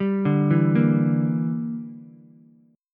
Bb 6th Flat 5th, Ukulele; 0,2,0,1; Bb6b5 arpeggiated; JGuitar

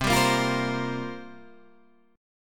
C 7th Suspended 4th, guitar; C7sus4 strummed

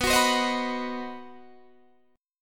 C Minor Major 7th Flat 5th B, chord

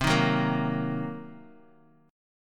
C Minor 7th Flat 5th, Bass Standard; Cm7b5 strummed; JGuitar

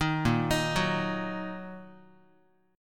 Bb Suspended 4th Sharp 5th Bb down Guitar; arpeggiated

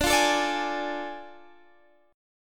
A Major 7th Suspended 4th Sharp 5th

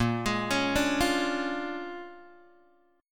A sharp 7th Sharp 9th, Guitar, Open G

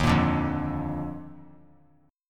D sharp Major 7th Suspended 2nd; strummed; JGuitar

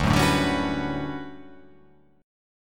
D Major 13th D down

